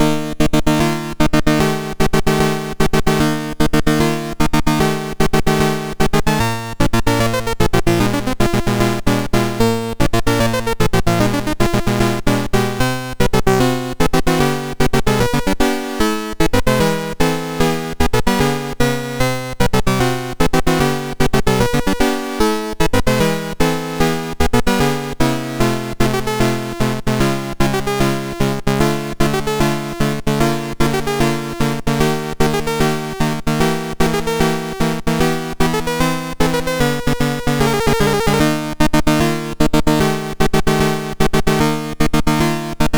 Fanfare, sid MOS6581R2

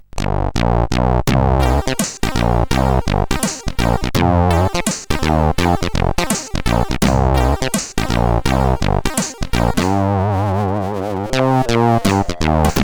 Vibratus, CSG 8580 R5; hvsc

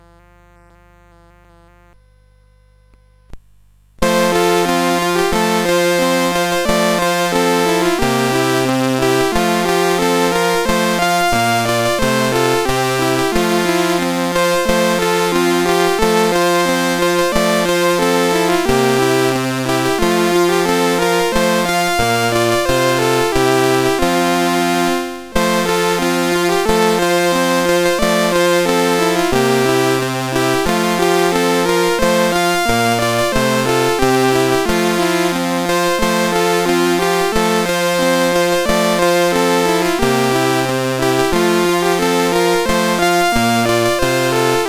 Banjo, CSG8580R5

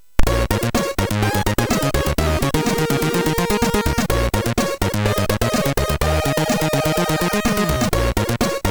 Funk Bass, MOS6581R3

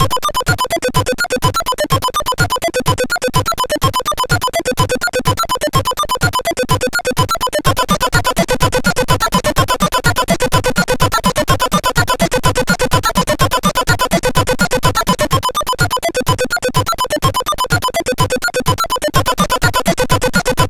Techbeat sid MOS6581R2

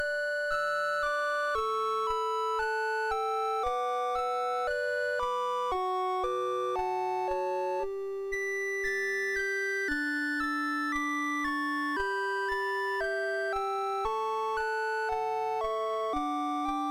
Canon, MOS6581

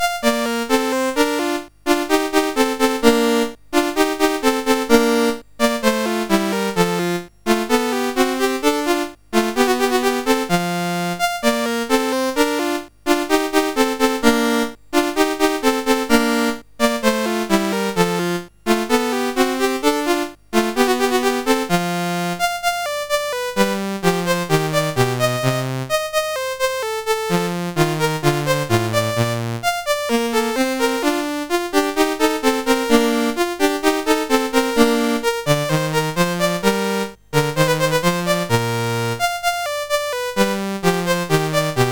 Allegro in B Flat Major, CSG8580

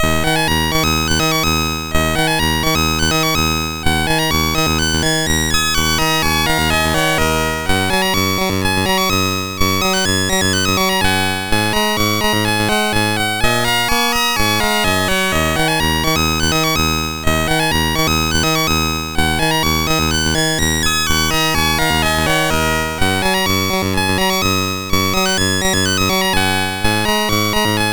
sid MOS6581R2, GAMES; Changs Adventure

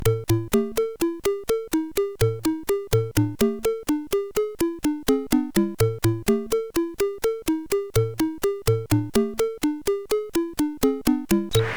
Bassline, CSG8580R5; sid

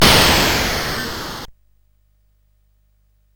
Synth Sample, CSG8580R5

